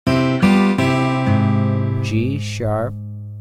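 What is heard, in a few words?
G#1 Acoustic Steel Guitar Major Note Name; ear training instrumental color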